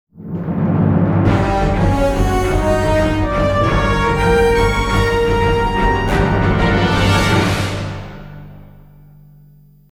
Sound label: Agent secret link; Jingles medley